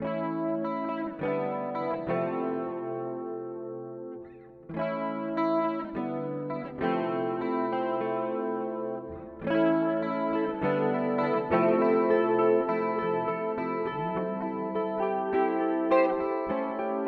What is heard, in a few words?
Eventide H9 Dark Chorus